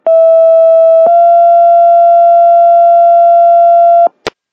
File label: Pager Tone